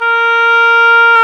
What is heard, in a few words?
oboe, Oboe Brth